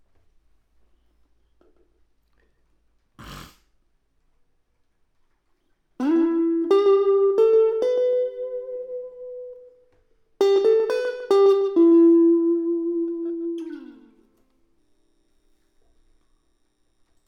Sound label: Elect Guitar